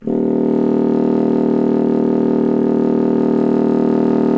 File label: C Bassoon; Miroslav Vitous - Solo Instruments VOL-1